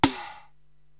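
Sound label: snare drum Sizzle, Drum Machines, Korg TR-Rack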